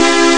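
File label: Noise Major; rare gems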